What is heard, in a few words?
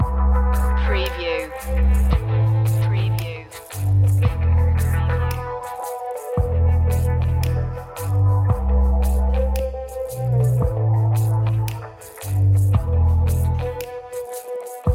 loop